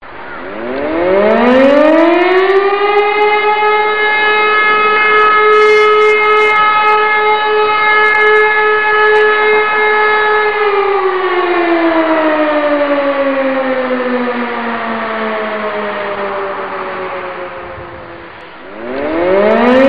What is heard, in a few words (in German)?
feuerwehr sirene ringtone